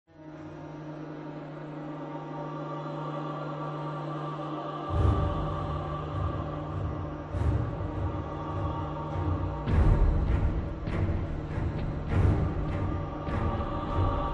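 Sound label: Fear, Dark horror background music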